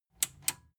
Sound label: metal button tiny click disable slow